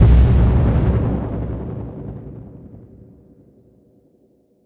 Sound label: Impact; impact samples